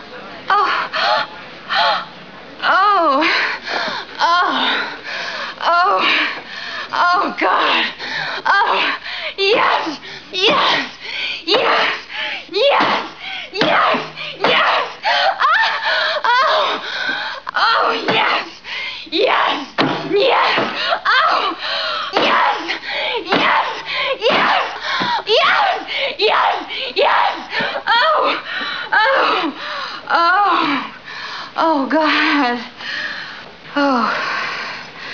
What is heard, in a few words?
orgasm